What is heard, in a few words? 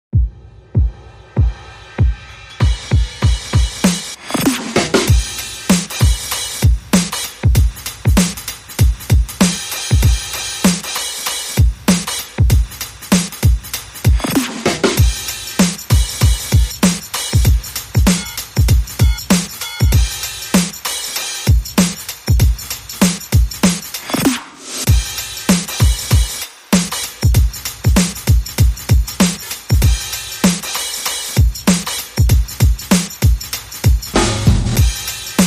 Drums Only